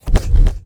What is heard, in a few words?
Menu Fail Error